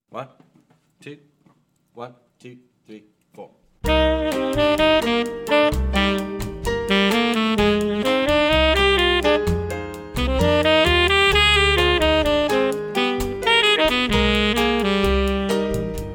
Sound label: latin etude tenor sax